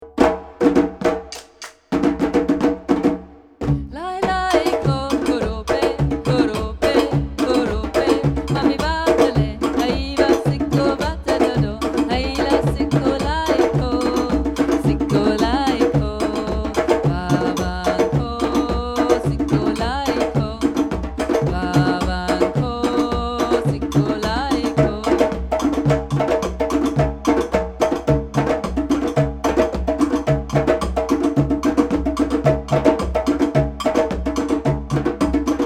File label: lailaiko, drums